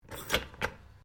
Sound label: Toaster Popup Lower; Various kitchen appliances; Household